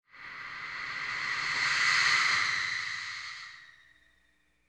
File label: Ghastly Screams; MinorHallutinationsSounds; SFX Producer